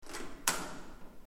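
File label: door open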